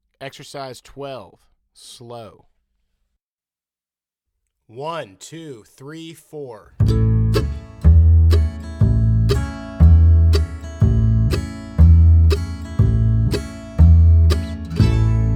Slow

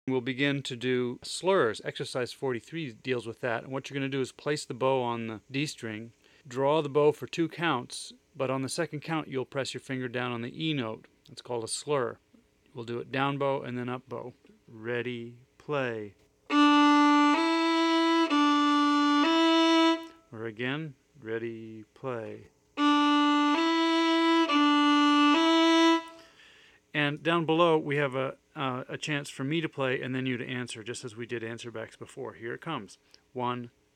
Slurs

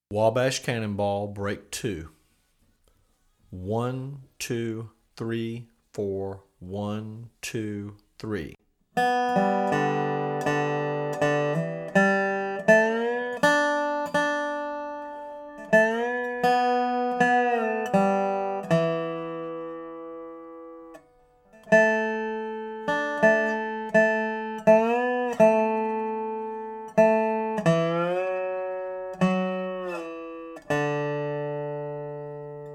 Slow; Wabash Cannonball

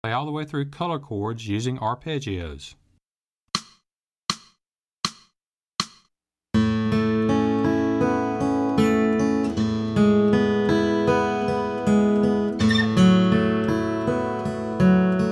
Color Chords Slow

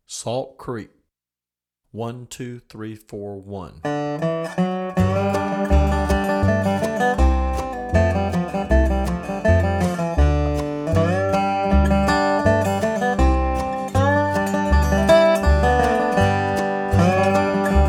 Salt Creek Fast

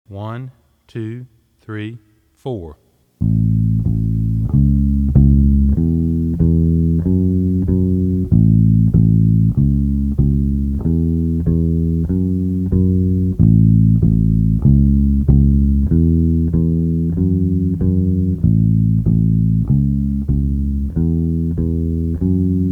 Walking Eighths In A Slow

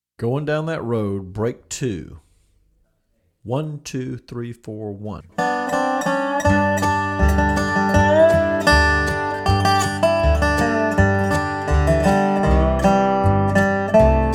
Going Down That Road Fast

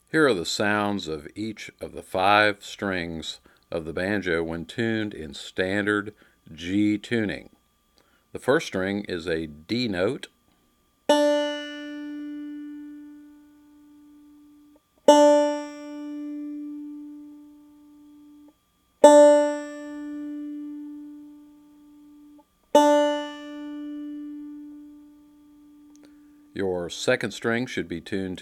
G Tuning

